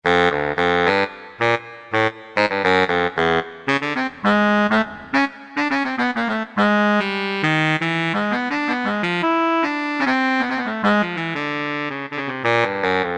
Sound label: Baritonsax, Korg T3